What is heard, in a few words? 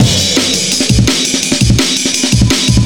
War Break; Jungle; Breaks; Blu Mar Ten - Jungle Jungle - 1989 to 1999 Samplepack